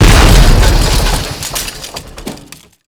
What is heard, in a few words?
speeder explode